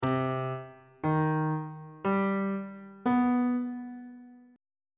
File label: b sharp harmonic minor 7th chord 1 is b sharp minor major 7th on bass clef